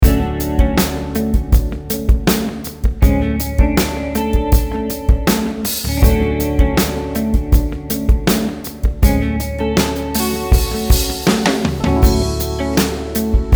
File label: MELODIC ROCK; POPeHOUSE